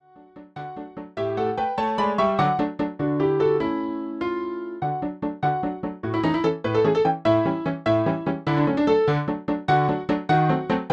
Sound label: Briccialdi piano track